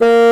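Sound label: bassoon A#3; East West - Global Sounds; WOODS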